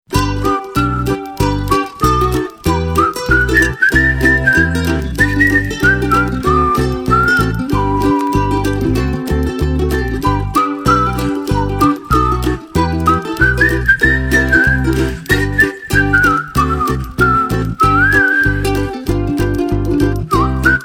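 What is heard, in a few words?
UKE CAN WHISTLE, ukulele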